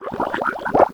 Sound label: poison; wesnoth